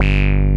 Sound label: ACI WAAH BS, TECHNO; Pro-Rec - Synthsonic; Z1 TECHNO